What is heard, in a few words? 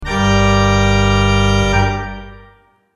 Wet Synth Gulp